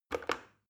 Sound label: plastic button start click regular fast